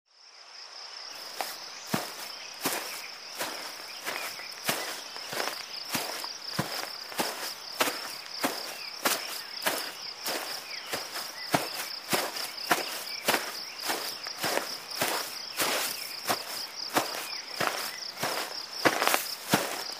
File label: Footsteps in the forest